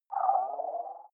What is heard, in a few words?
game start dull